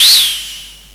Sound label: Fire; Galaxian